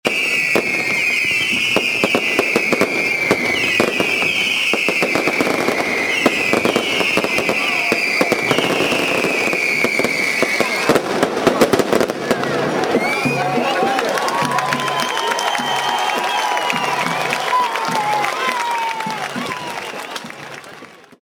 artifice

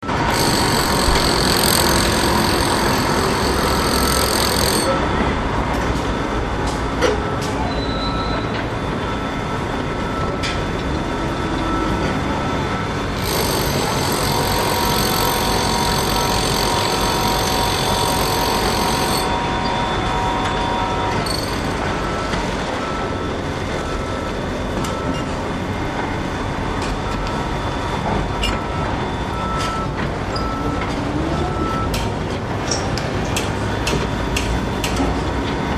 chantier

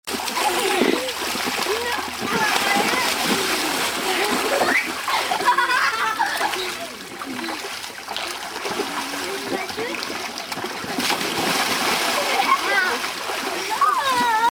piscine

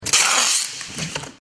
scotch

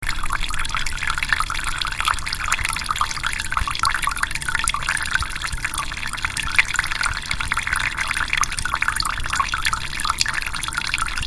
eau

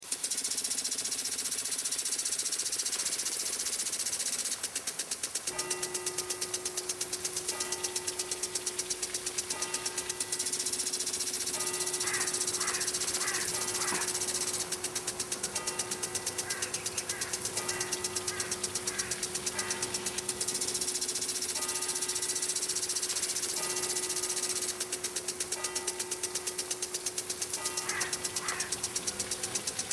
jardin

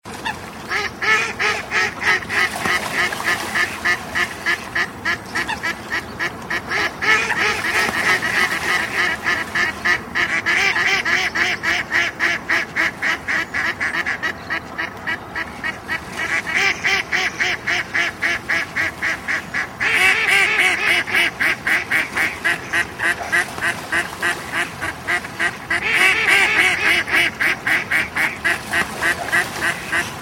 coincoin

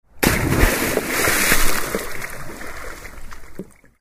plongeon

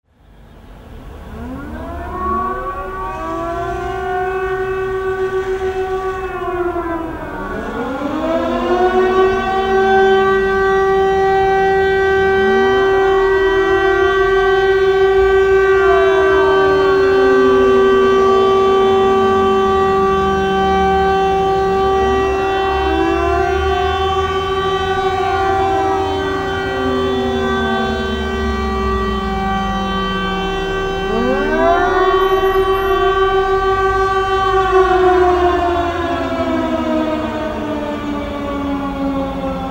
sirenes

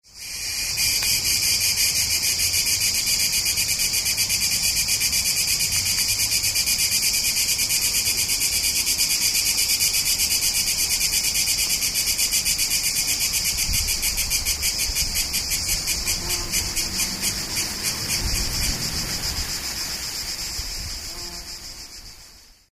cigale

